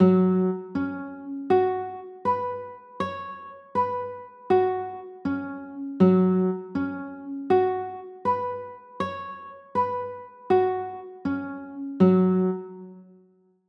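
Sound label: G flat sus4 chord, guitar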